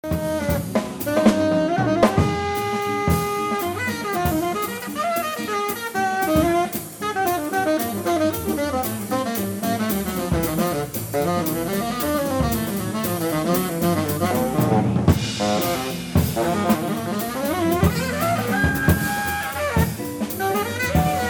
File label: Machine Blues End Solo